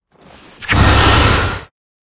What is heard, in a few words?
bonus melee; Recode; rampage riches Desktop